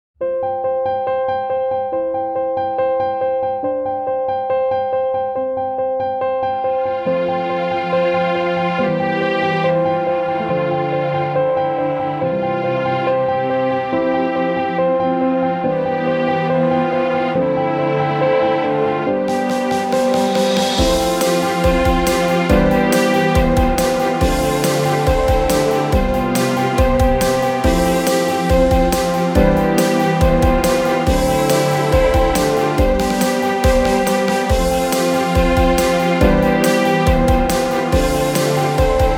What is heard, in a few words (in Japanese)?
商品紹介にありそうなBGM